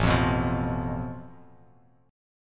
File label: A Suspended 2nd Sharp 5th, Bass Standard; strummed; JGuitar